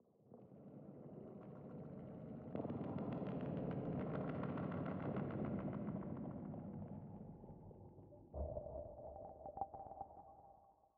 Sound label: Basic Low Beat 85bpm; Legends of Pegasus; windows gamerip 2012